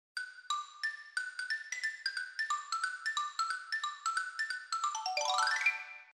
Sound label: Xylophone